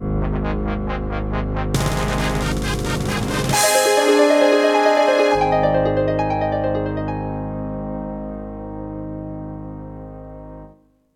fanfare; ROTT Sound Canvas 55

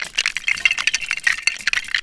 LEGO DEBRIS; Junkbot; lego star wars force awakens

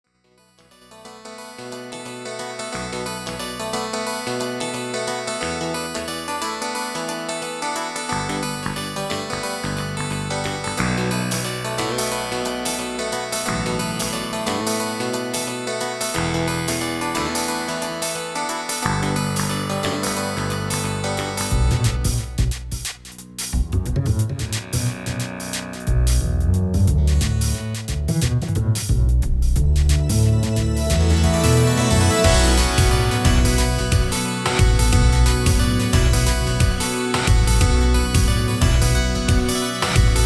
Retrowave, Amiga

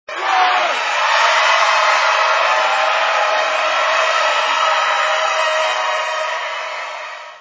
party; undercover; Junkbot

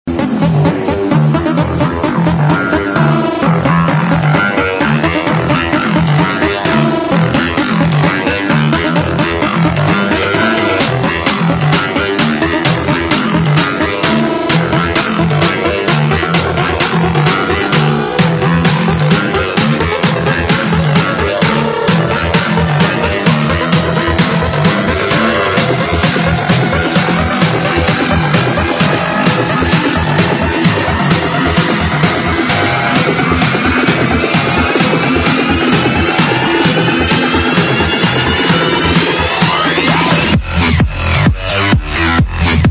Robot Bass; Dubstep

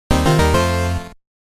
Item Sound; Princess & Conquest v0.20.06

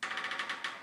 Creak; Princess & Conquest v0.20.06

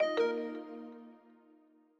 Notify; Longhorn XP Sound Scheme by David Mounder; Reincarnation of Windows XP Sounds in fake Longhorn (Samsung Theme for WinXP) style by David Mounder

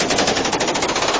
shield on; Junkbot

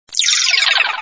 teleport; undercover; Junkbot

